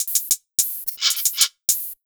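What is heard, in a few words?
110 hi hat loop, Ragga & Dub Reggae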